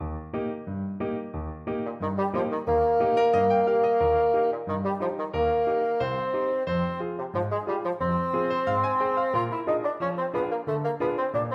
sortie, bassoon

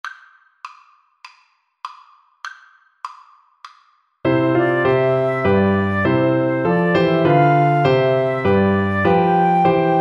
midnight clear, Violin Flute Duet

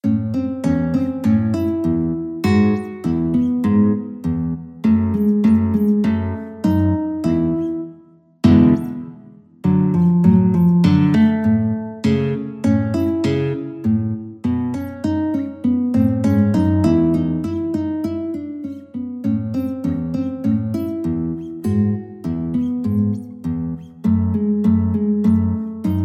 guitar

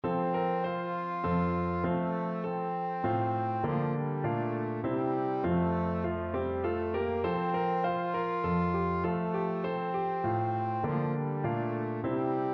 trombone

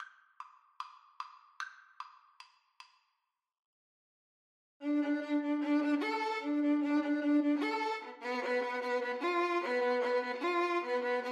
violin duet

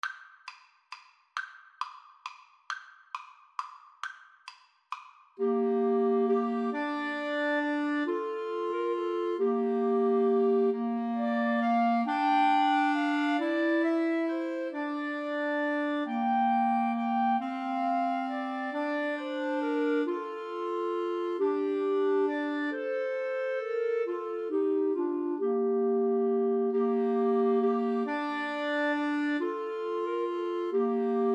Clarinet Trio

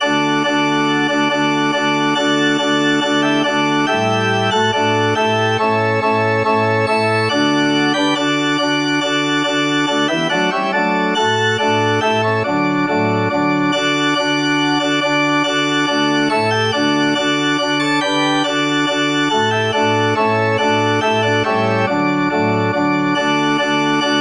glad new year, organ

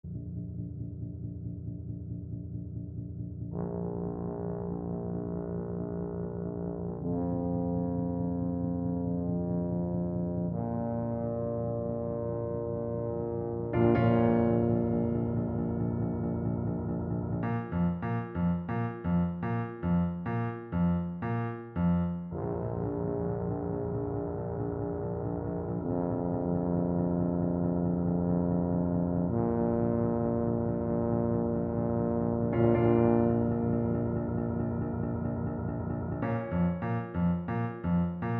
also sprach zarathustra, tuba

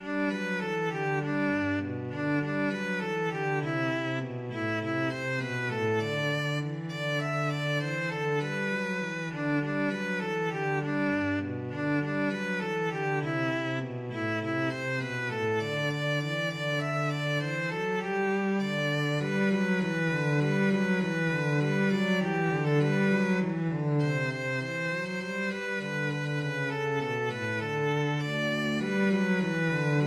violin cello duet

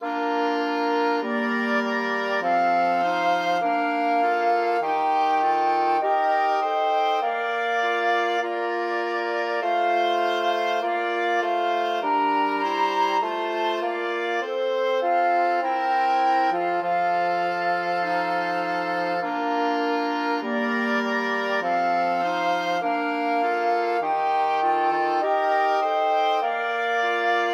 flexible mixed ensemble, 4 players

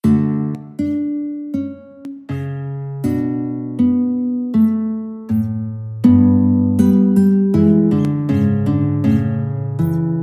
guitar trio; bach

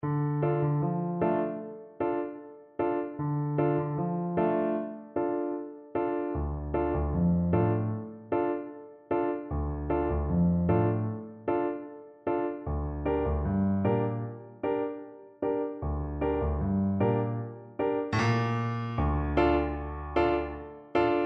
horn, French Horn

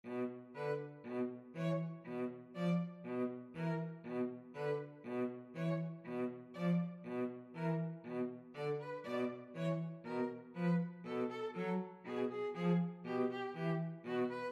violin cello duet

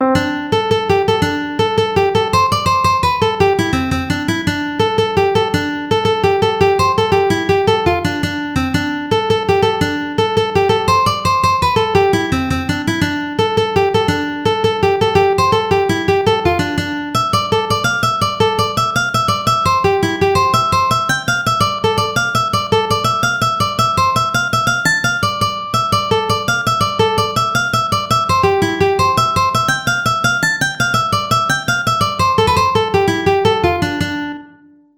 ukulele

